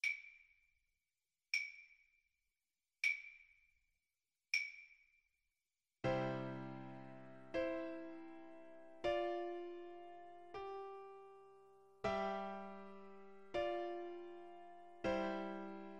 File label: beethoven ode to joy kar, Flute